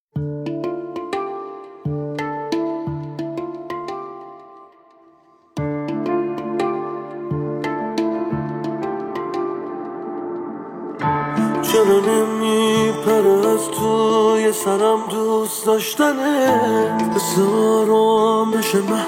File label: unplugged version